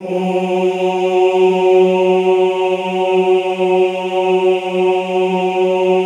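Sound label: OOH F#2 left, classical choir; Best Service; Extended Classical Choir; OOH MIX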